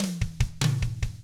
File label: 96 ROCK 6-8; Drums